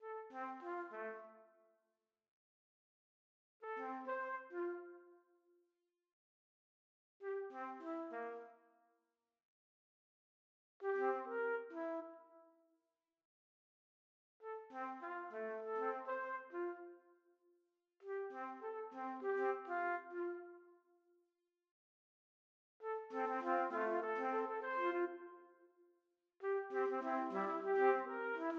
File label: skip to my lou trumpet duet